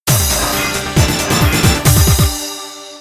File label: Warning; Space Invaders Extreme HD